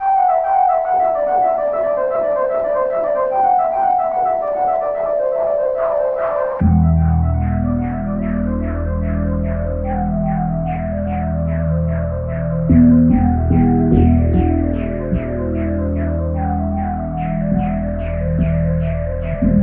tornado

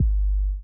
distant whale; Kicks

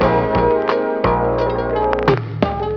full of keys, Loops Phonky

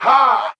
"ha", Voice

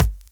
Kicks

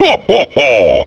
laugh satan

